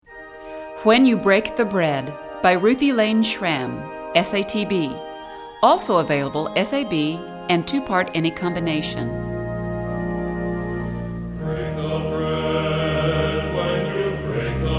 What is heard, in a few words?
SATB